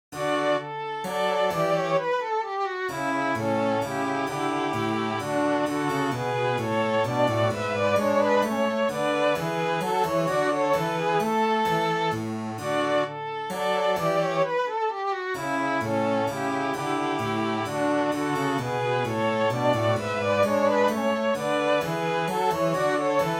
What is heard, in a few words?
Pieces for String trio; n.3 DM